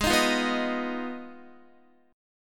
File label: Ab Minor 6th, Guitar; strummed; x,11,13,10,11,10; down; 4E,4A,5D,5G,5C,6F